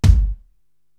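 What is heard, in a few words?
BD Pumping Club, bass drum, drum machine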